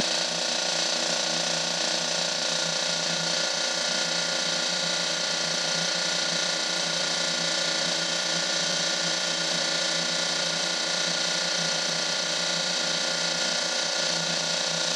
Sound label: ambience electric hum